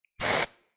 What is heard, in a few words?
Deodorant spray